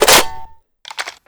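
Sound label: weapon foley m82 reload clip in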